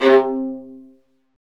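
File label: Marcato, Strings; Vlas Marcato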